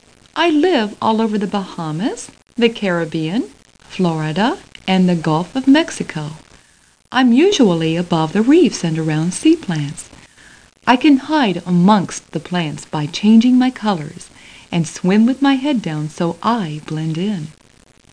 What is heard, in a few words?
Sound trump